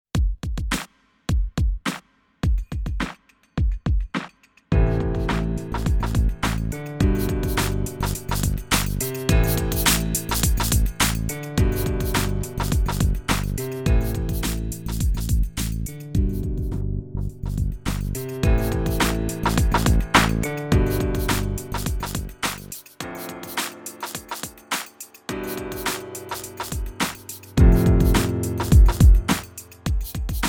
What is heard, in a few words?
EQ Hip Hop